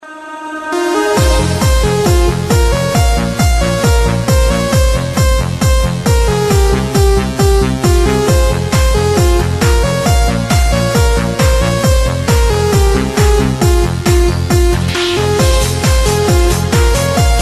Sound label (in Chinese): 劲爆舞曲 劲爆节奏